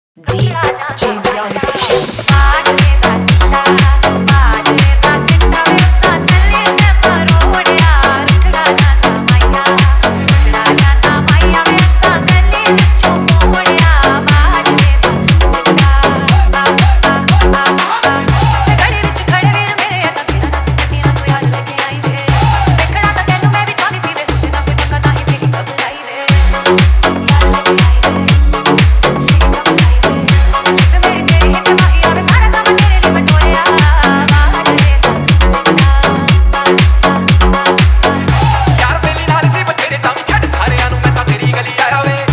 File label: Bass Remix Song